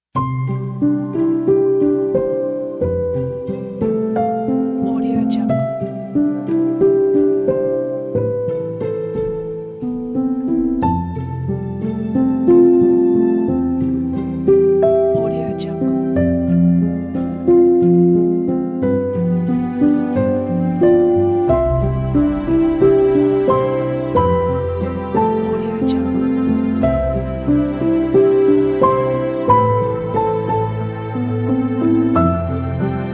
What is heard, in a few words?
cinematic wedding background